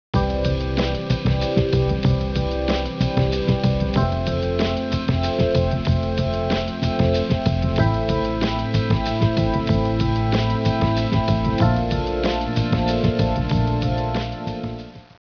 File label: music